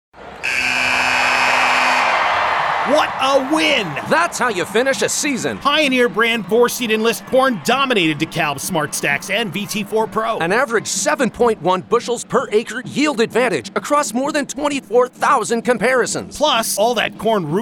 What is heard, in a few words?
BBALL BUZZER; Pioneer